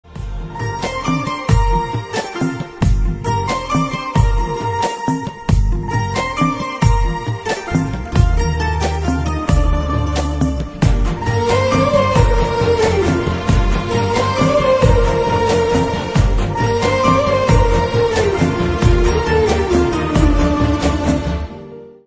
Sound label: BGM Ringtone